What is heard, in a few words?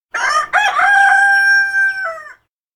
rooster crow; anemoi